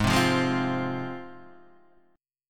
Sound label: C Suspended 4th Sharp 5th G sharp